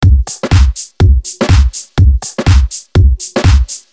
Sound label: Drum Set 123 Full